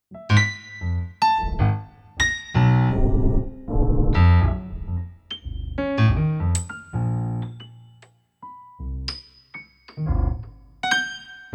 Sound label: piano; random midi notes player